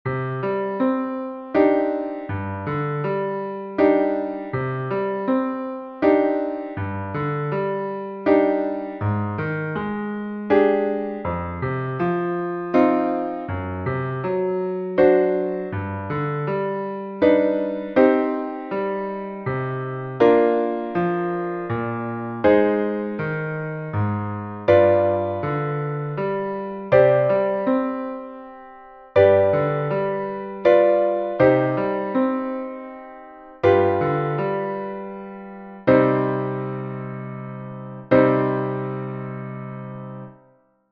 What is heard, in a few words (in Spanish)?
entonacion Piano; Entoación con acompañamento